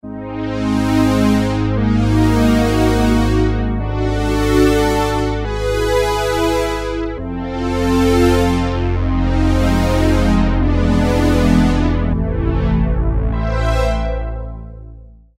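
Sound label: String Sweep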